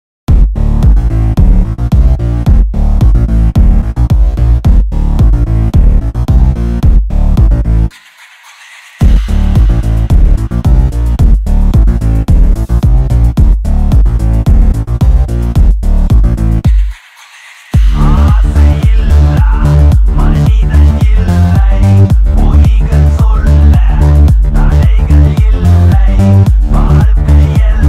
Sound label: Bass Boosted